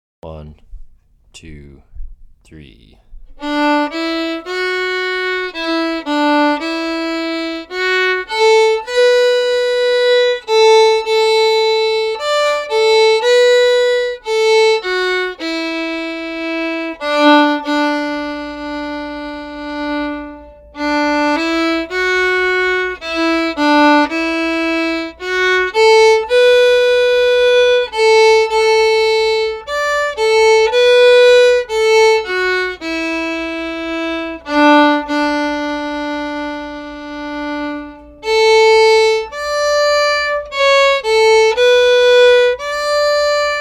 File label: sally garden slow